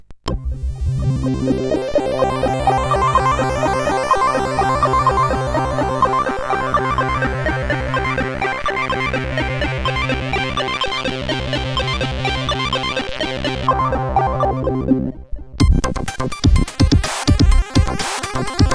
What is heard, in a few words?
Intro sid CSG8580R5; Spacebar Intro